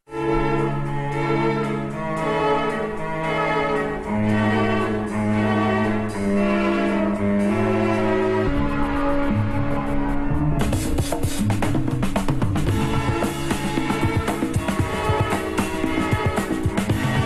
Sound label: ringtones; series tv; mad men